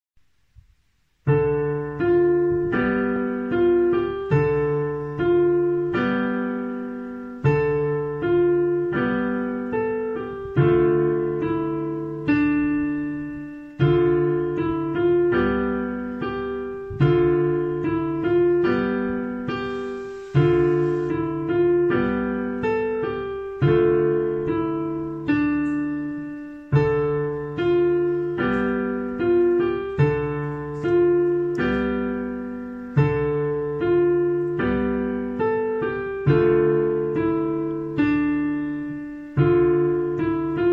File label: Instrumental; با فلوت